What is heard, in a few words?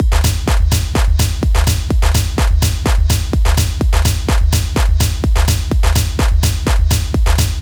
126 Full Drum Loop